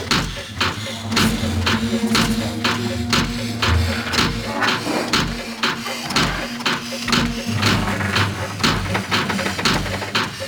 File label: Door Loop; Orokin; Warframe; Sacrifice; Shrine